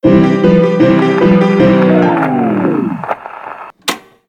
WILD WEST STING CANCAN; LEGO Marvel Super Heroes 2

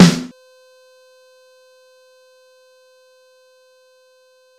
Room Snare

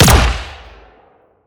Sciweap Shot Small Gun Chaos Colt; Robobeat

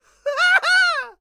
laugh success; Scrat

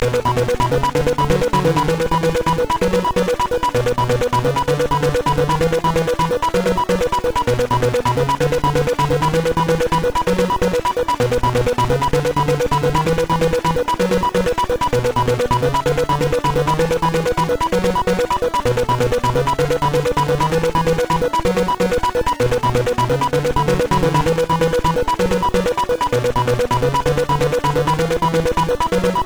Scoring Position; baseball pros; quattro sports; nes

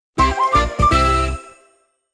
Jingle Success; Pokemon Masters EX

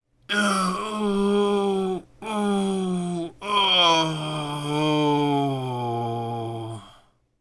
Grunt

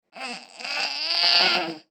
baby grunt

